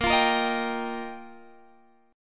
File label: F 7th Suspended 2nd Suspended 4th